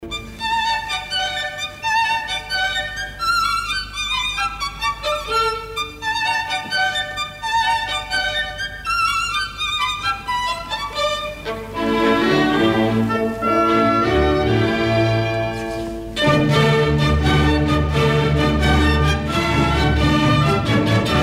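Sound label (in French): Concerto pour violon et orchestre en ré majeur op. 61 Rondo Allegro; BEETHOVEN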